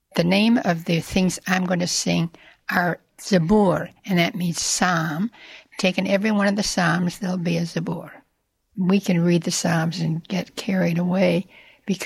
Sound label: hymn